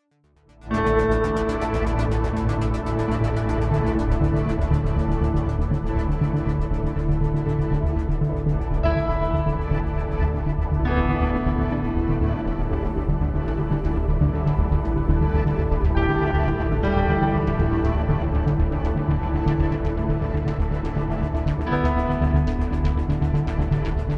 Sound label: Drone